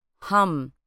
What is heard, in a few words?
hum